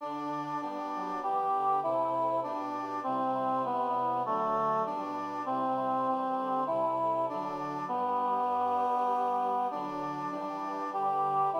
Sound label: Alto; Fulda; Choir